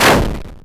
shotgun, weapons

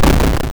hard, explosions

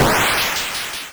cluster, explosions

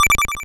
sounds pause in

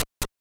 stairs loop; movement

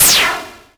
soft, explosions

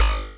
screams android

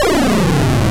falling

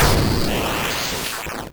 long, explosions

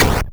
impact

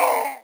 screams human

